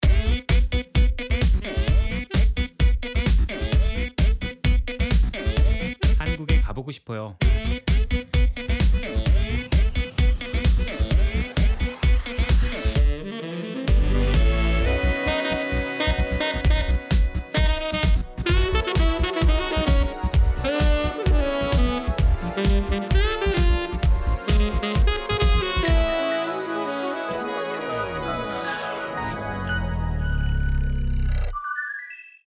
Dance Band; MukBang

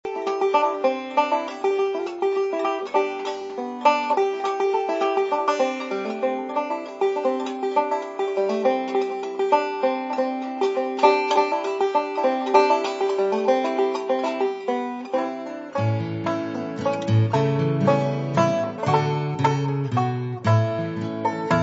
bluegrass

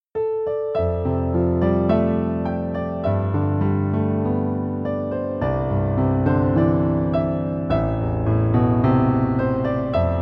Spring

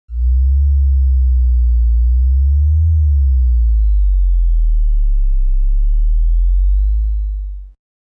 earthquake; Tiny Sounds

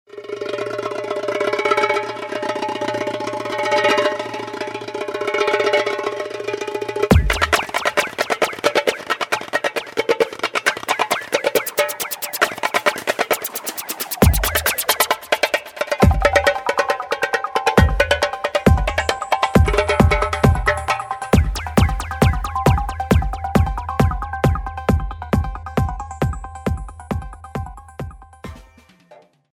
Tabla Ala Stereo

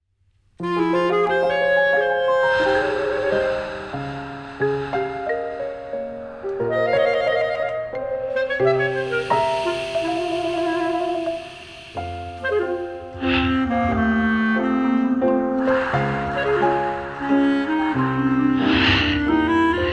Sad Comedy

